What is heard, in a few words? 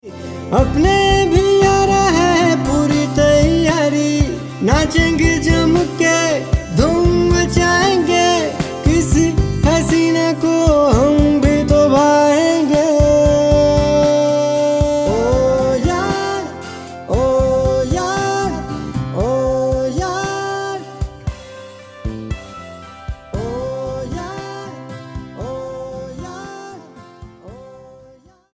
wedding wishing song Hindi male